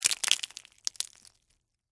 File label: Texture, Debris, Waffle, Cones; Materials; Blast Wave